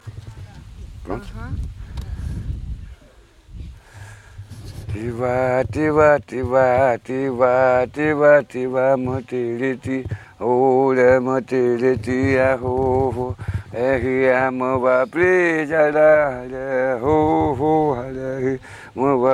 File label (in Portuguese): timbiras